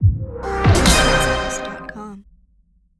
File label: dub cheer